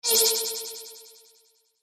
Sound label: Binding Spell Attack, magic; Magic Sound Effects; Andrew Fleming